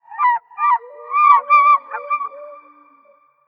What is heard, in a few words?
Plant Ext Rnd Lake Birds; moul-assets